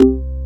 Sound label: Korg CX3, C 1